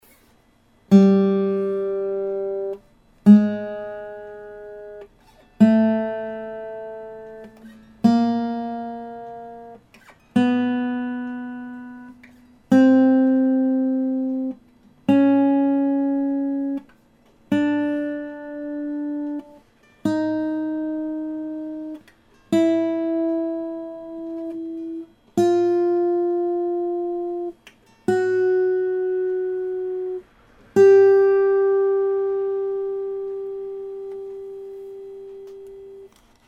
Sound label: yamaha after, guitar vibration